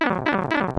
shrink; Mario; Super Mario Paint

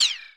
Laser Gun; Super Smash Bros

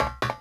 Ladder

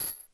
Chain Chomp Chain

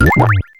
Yoshi Swallow Berry; Wii

